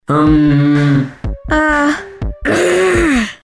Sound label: annoying